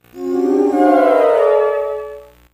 gamecube menu

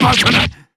damage; bb-jubei

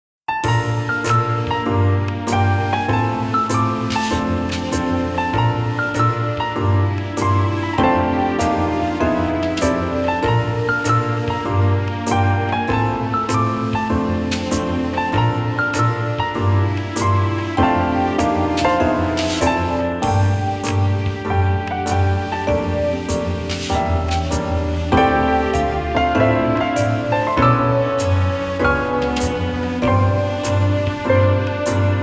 mall music; dead rising

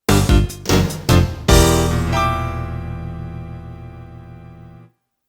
jingle; aai2

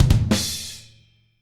rimshot

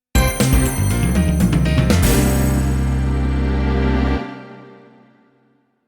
jingle

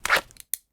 phone

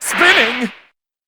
spin; rachel

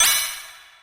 eye flash

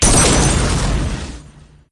evoker shoot

